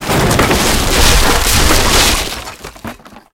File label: wood crash; tanks; War Thunder